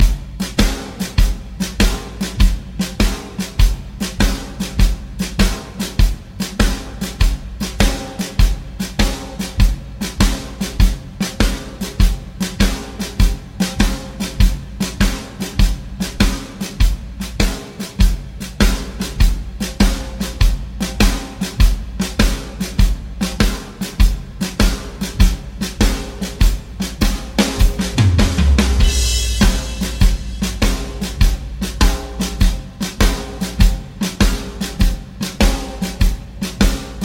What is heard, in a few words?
Shuffle Loop 100